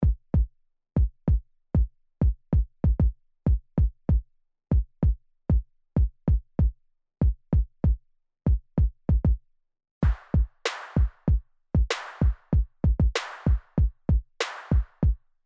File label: At Night Loop Sampler